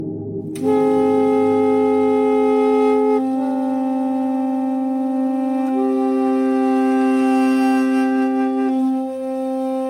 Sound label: sirens; the odyssey